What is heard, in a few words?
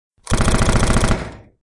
shooting; fn p90